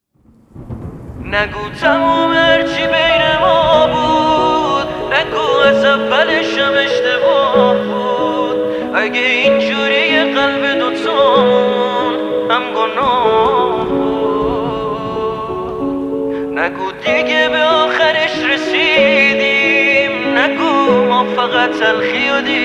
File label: Piano Version